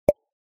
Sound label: button click; scramble words